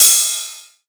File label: Ride, Techno Drum Shots; Drum One-Shots; Ghosthack; Free Techno Drum Shots 2024